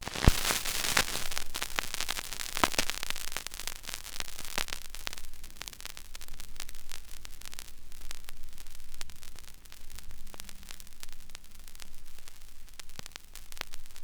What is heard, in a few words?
vinyl; Masterbits; Vinyl Attack